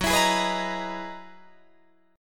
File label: F sharp 13th F sharp Guitar Drop D